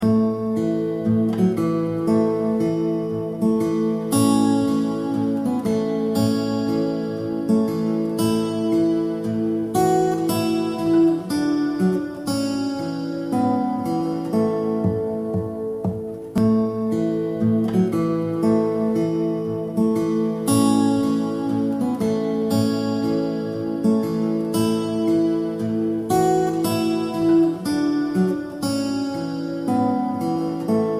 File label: amen fingerstyle